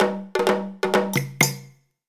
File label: Course Start; Yoshi's New Island